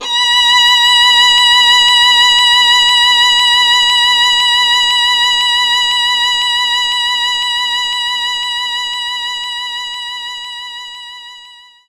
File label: Violin B5